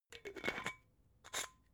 glass bottle massive close extra slow

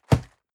box hit hard dry

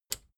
plastic button small latch release

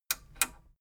metal button radio click disable slow

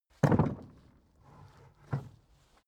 carriage hatch single soft

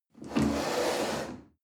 chair wood slide on floor medium

